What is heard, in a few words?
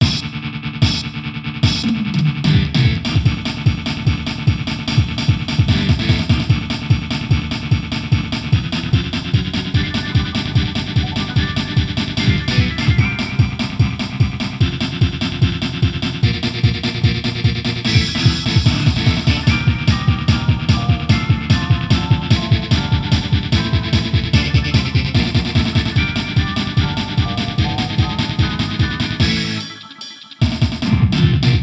Metal (Instrumental)